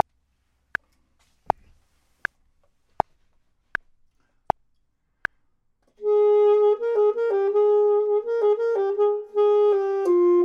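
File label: top, Saxophone and Clarinet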